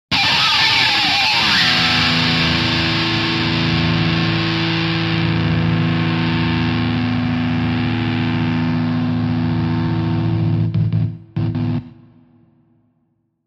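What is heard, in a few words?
Electric Guitar Plectrum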